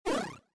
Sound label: Jump; Bayo Jump